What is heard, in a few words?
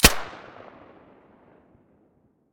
silencer fn2000; stereo